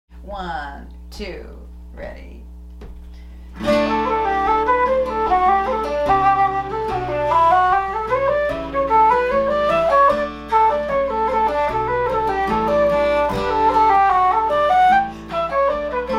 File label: Reel Flute and guitar